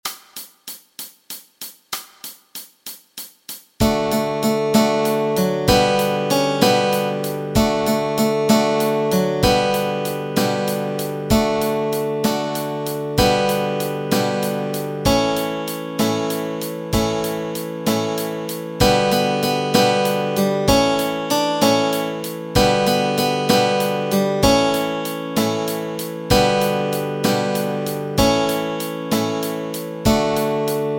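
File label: melody and chords, Easy Guitar and Ukulele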